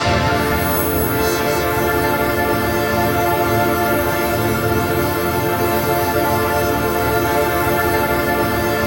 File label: PAD, Dance Mega Synth